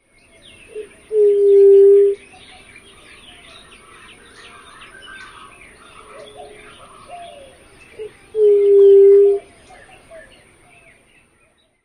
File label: songs